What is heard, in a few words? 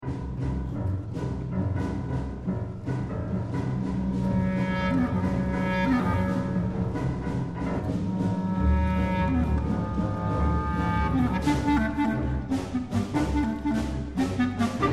clarinet